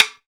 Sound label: Sticks; SB Sticks; Tonga